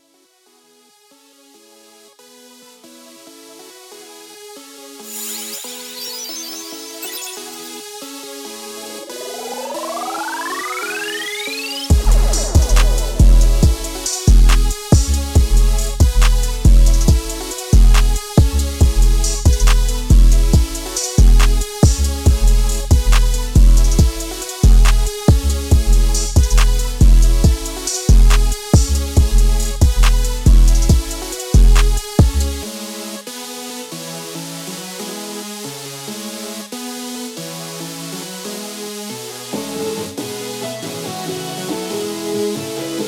HYPER POP

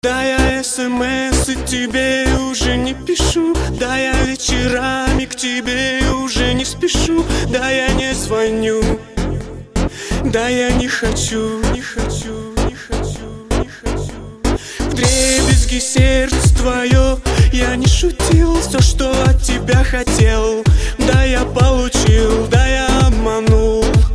electro music remix

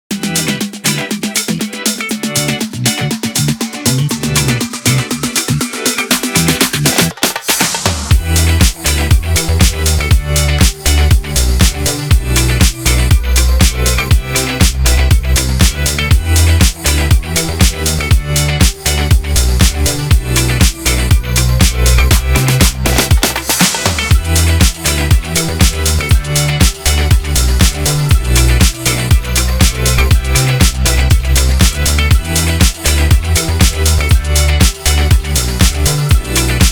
Tropical Synth Waves

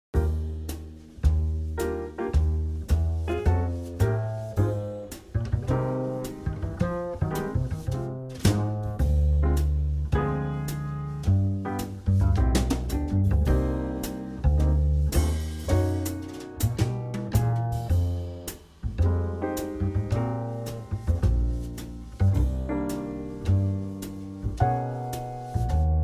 Relaxing Morning Coffee Jazz

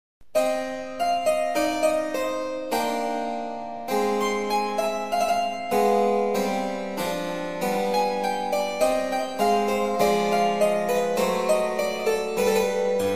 Sarabanda klavesin; I.S.Bah Francuzskaya syuita 2

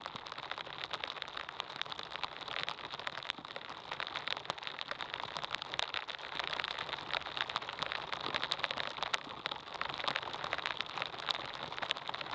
Lofi Rain; Nature Sounds XLE Library

